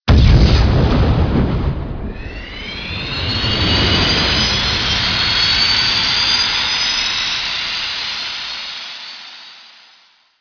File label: boost